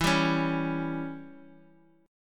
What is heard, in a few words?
E Minor 6th E